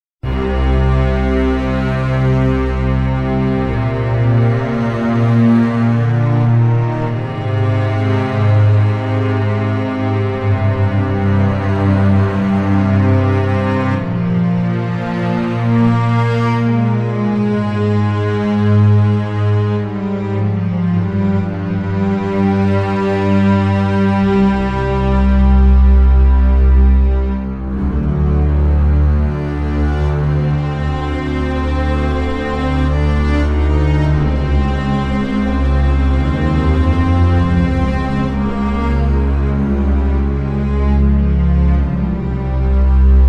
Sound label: dramatic calm mellow music